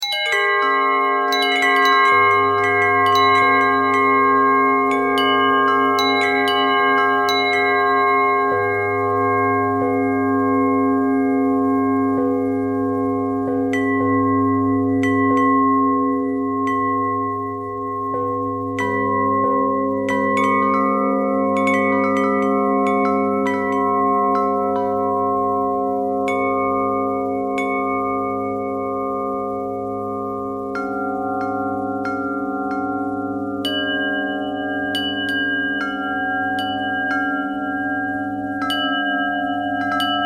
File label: bell bell thread pentatonic, bells